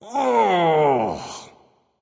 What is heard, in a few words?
ouch; smite